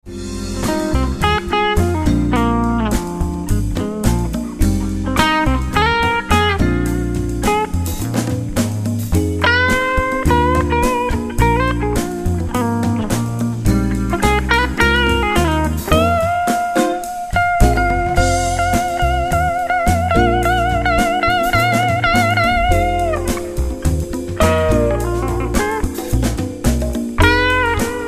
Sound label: Gun Solo